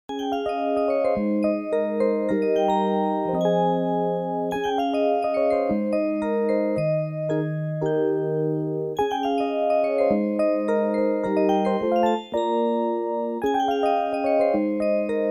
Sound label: Wedding Dance